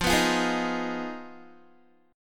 F Minor 7th Flat 5th, Guitar; Fm7b5; strummed; 4E,4A,5D,5G,5C,6F

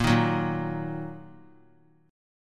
Bb Suspended 4th Sharp 5th Bb down, chord; strummed

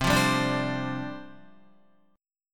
C Suspended 2nd Suspended 4th, down, Guitar

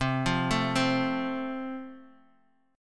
F Major, C

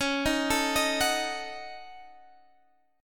D sharp Minor 7th Flat 5th C sharp

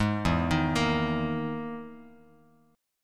E-Major Sharp 11th E, Bass Standard; EM#11 arpeggiated; 16,7,13,15; JGuitar

